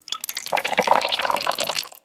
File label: water pouring into a plastic container